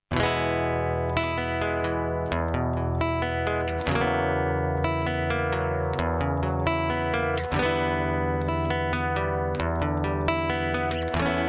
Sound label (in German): Akk Gitarre dbx als Line In Preamp